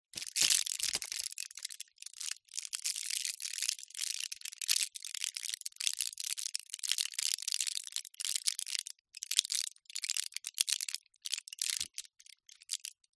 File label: household medicine nicotine gum foil strip handling